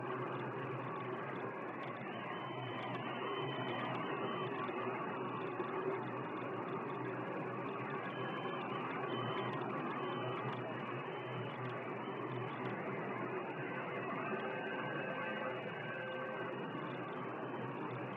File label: Radio Static Artificial; In The Digital Wilderness